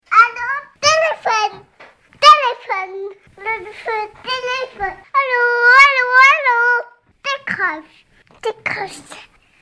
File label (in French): telephone